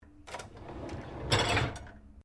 Dishwasher Bottom Tray Loaded Close, Household dishwasher; Dishlex; Appliance; Appliances